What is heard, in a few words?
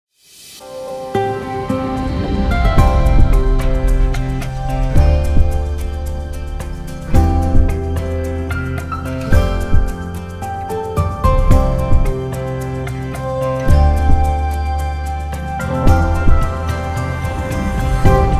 Theme Emotion; Radio Horeb